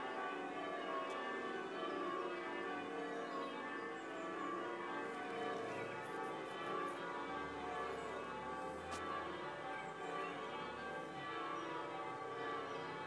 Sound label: church bell mechanics bells and a bus